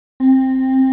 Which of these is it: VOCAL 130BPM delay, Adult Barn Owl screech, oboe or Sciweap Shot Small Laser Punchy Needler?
VOCAL 130BPM delay